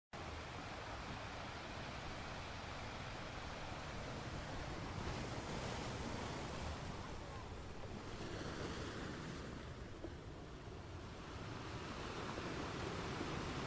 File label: ocean waves sounds ocean sounds Calming; Sea